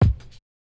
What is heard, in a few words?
Kicks; Touch Loops; CosmicFunk